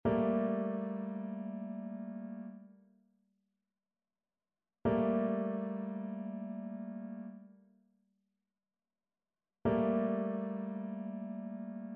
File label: trichord; trichord identification